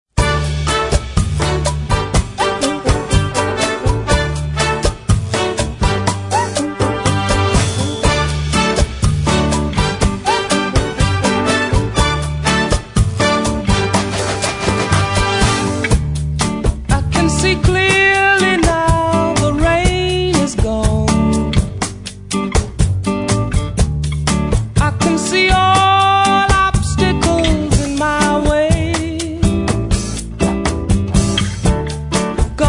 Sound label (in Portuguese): Samba